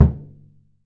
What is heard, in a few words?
Surdo; E-MU Producer Series Vol. 2 – More Studio Essentials; Composer; Surdo Pallet